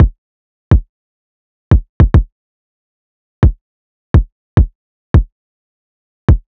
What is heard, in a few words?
Riddim Drum Loop 140 Kick